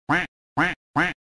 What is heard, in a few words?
duck Quack Sound Effect